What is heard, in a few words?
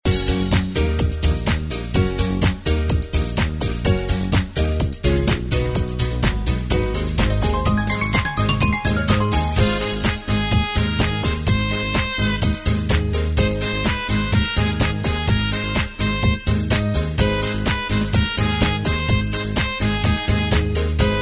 harmony